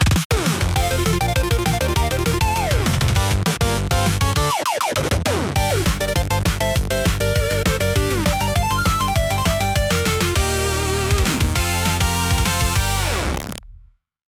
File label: 8bit level up fanfare long; free jingles